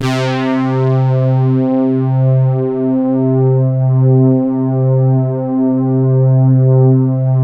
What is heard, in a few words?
flat pad; Sound Gallery - Charged